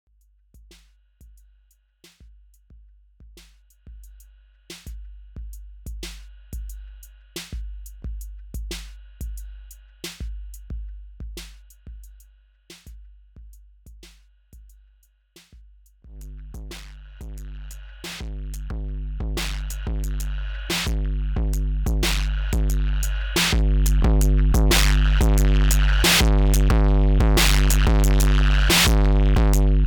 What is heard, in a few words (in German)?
vcf1 Input Drive Distortion Output Drive dann alle drei Zusammen